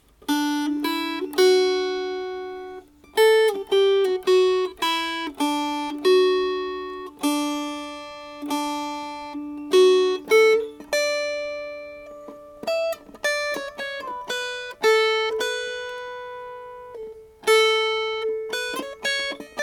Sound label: Melody, Dulcimer